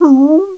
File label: CRY, dogz